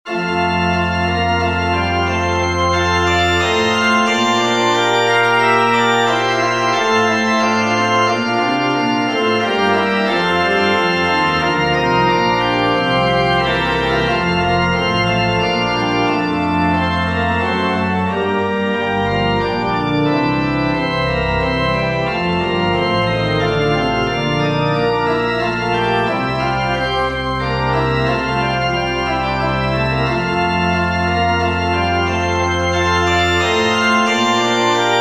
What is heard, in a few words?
chorale for pipe organ; bach johann sebastian; lamm gottes unschuldig